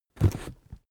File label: cardboard box small lift handle; objekte